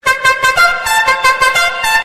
claxon cucaracha

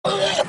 frena auto

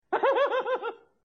risa; mozart

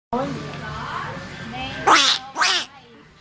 cuac cuac

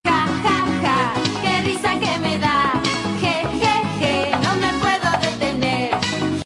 jajaja que risa que me da jejeje no me puedo contener

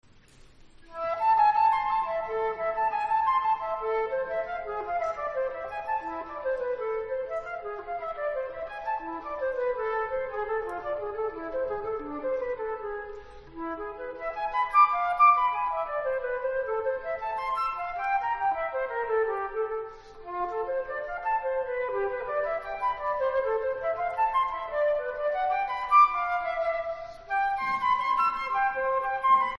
flauta

